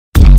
bwomp bass boosted

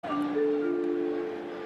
estadio stadium sound; estadio jalisco

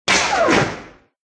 ricochet; star wars

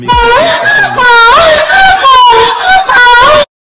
ahahahha

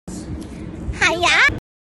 hiyaaaaa

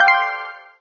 correct answer sound; didj

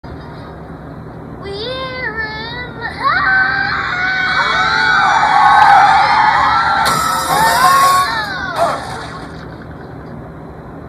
singing screech

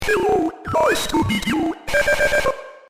angry

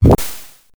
fireball; sonic the hedgehog

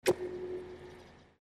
mail open

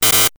laser; sonic the hedgehog

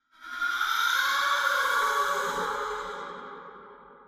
long aaughhh

